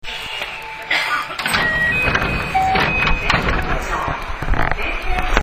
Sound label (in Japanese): ドア開け, 223系0番台; JR西日本のお得意体質改善施工車。ドアチャイム設置車両はこれ。; 中期改造グループからはトイレの中身が変更され、真空式から今までの水流式に。